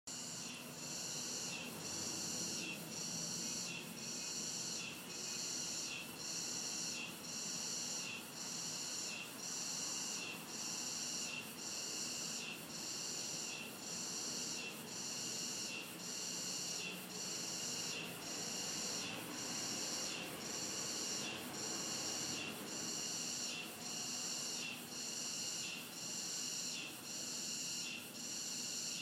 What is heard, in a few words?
Cicadas Singing